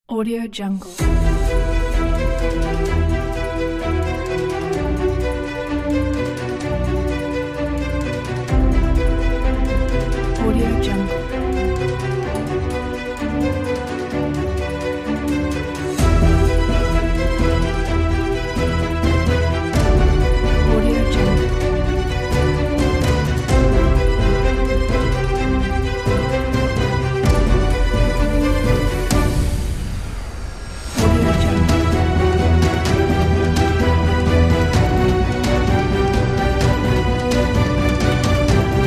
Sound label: the heroic dark cinematic trailer